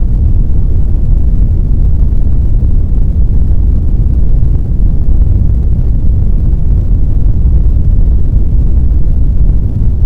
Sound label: rumbling of the earth